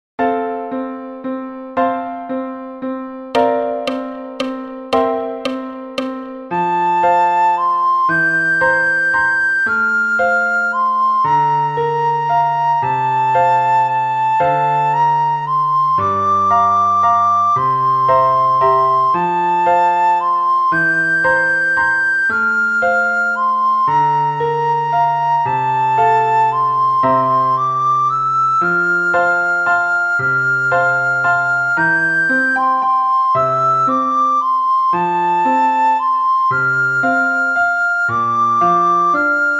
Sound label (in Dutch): sopraan; Flierefluiter 6